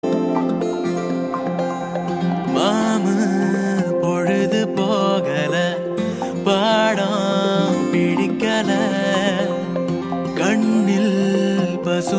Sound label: Bgm Ringtone